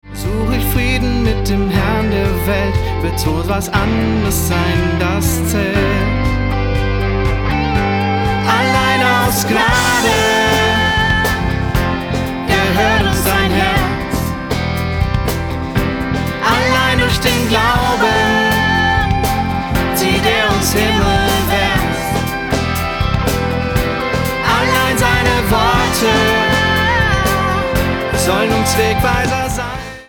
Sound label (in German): Indie Version